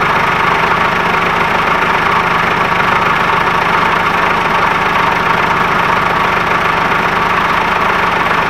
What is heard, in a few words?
tank move stop